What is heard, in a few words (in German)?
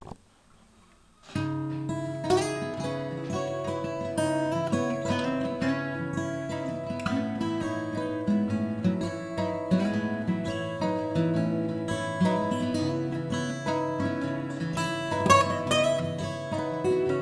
microbr akustiktest